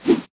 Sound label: fall; Golden Crayon Sounds